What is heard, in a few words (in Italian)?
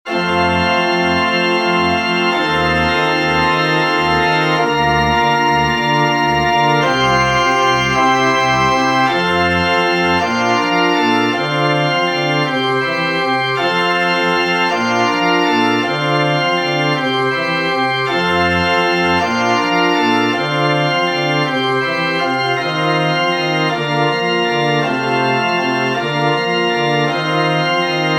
organo